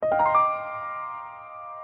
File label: sms